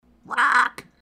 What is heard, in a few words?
Rawk, parrot